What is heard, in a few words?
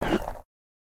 Dash Stop; Caffiend; Going Under